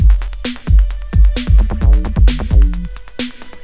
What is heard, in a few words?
electro; Guitar&Electro